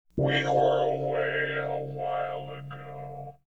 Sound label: Voice Gong